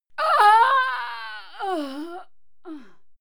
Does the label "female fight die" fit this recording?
Yes